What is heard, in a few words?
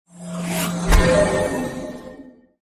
skill bullet